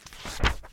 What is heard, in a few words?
page flip heavy; OneShot Fading Memory